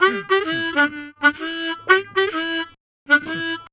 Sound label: Harm 129bpm G, Harmonica